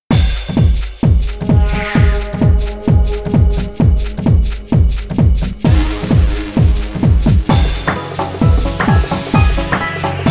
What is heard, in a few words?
FLUTE MAN; beatmania IIDX 8th style